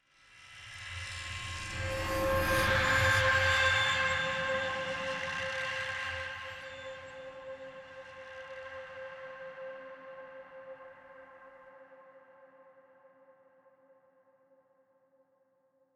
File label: Riser; Operating Theatre; Descendant